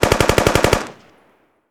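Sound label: m16 exterior shots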